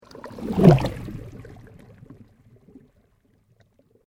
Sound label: Sweep Organic Slow; Created sweeps, swooshes and other movement effects; Sweepers; Sound Design; SoundEffects-Foundation4